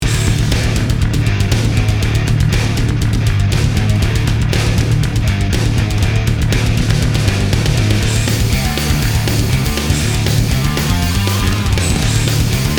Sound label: Mix rev plate